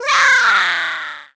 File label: Bokoblin Die